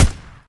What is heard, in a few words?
Rifle; MilkChoco